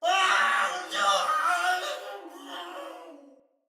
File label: Death Fire, Soldier Male; Battle; Barks; Dialog; Sinecure Soldier Male B